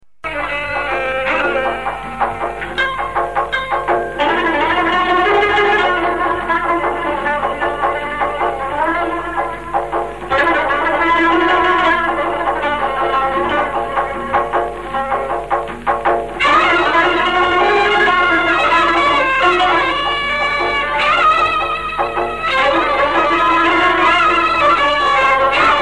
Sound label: Maqam; S.Kurdistan Maqam; Mamle Maqam